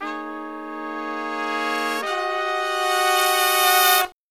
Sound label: Killer Brass Riffs; USB Soundscan vol.29; AKAI